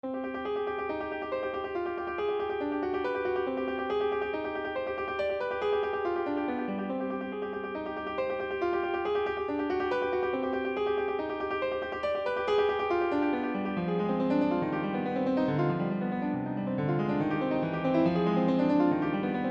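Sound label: Toccata Gallop, piano; Tokkata-galop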